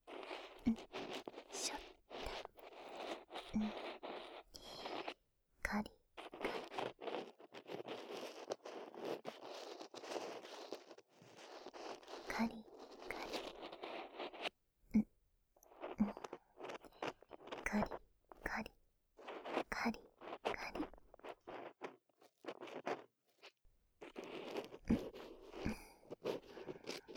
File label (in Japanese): 耳かき 右